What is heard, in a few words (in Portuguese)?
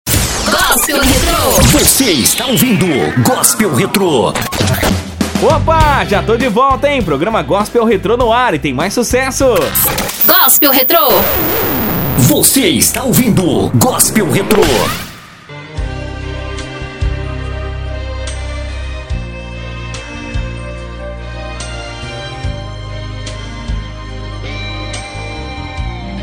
Gospel Retro